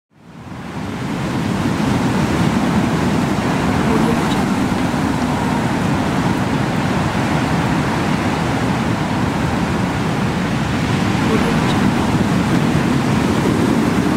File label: blizzard